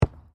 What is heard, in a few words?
RUGBY BALL BOUNCE; Various rugby sounds; Miscellaneous Sports (Sound Effects:Sports)